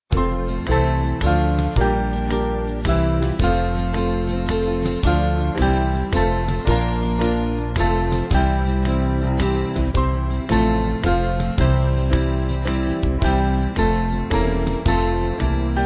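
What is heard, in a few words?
band, Music Backing Files for Churches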